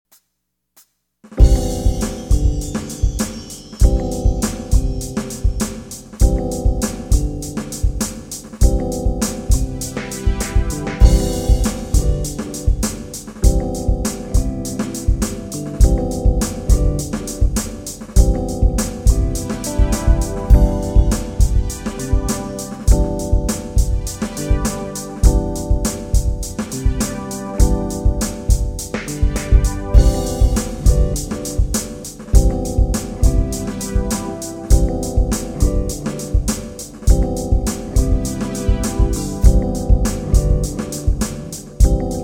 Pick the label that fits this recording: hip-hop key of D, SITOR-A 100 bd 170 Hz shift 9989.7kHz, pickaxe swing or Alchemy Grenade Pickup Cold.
hip-hop key of D